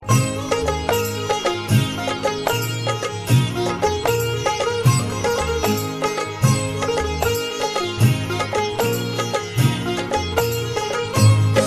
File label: Sitar